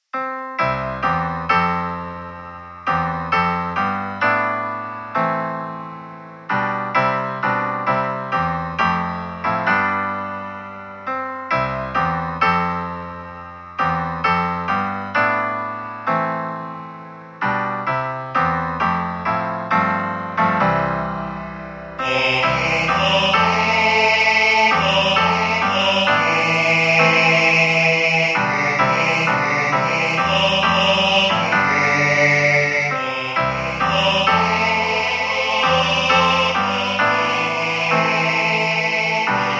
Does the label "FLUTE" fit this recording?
No